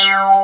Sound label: TRANS X BS; Pro-Rec - Synthsonic; TRINITY